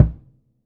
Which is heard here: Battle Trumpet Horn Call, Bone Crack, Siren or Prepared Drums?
Prepared Drums